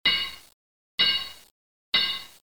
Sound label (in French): Forgeron; bruitage; Outillages